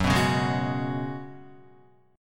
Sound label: F sharp Suspended 2nd Suspended 4th, Guitar; strummed; JGuitar